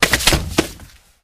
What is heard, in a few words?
Body Falls On Cement